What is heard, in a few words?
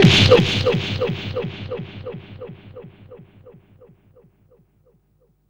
FX, Drum & Bass